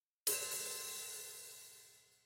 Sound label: open hihat